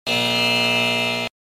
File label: error